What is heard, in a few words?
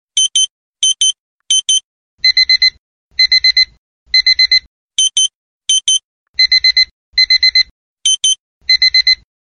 Wake Up Alarm